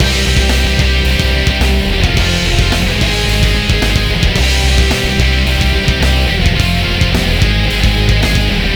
Ditty; NBA Inside Drive 2003; Xbox

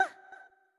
Attack; Winkubus

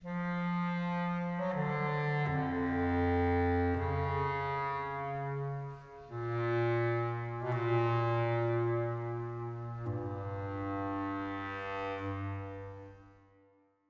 Solo Overlay Phrase 80bpm 4-4meter; Planet of Lana

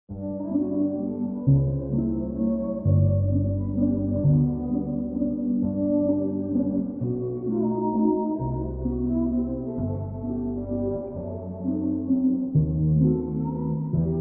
Waltz Reverb

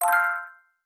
Coin

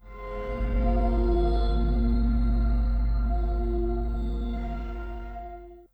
portal exit; 0°N 0°W

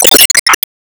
Enemy Silkhat Smoke

